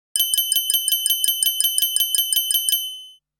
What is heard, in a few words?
Bespoke, Nokia Alerts; Windows Phone apps